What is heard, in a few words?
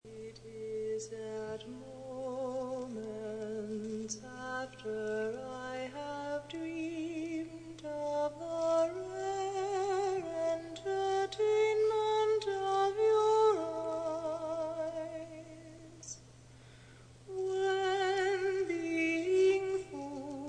Solo for Voice